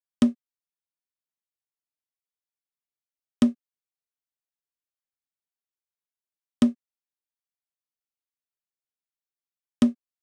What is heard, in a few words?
Nostalgia Drum Loop 150 BPM Percussion; Cymatics - ANALOG EVOLUTION Beta